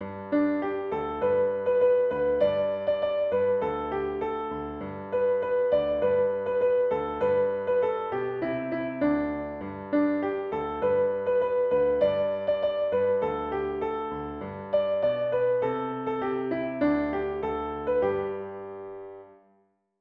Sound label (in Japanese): ピアノ